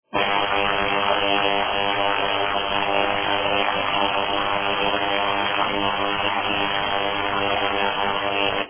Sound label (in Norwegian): Sparepære